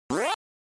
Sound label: Arcade General Pitch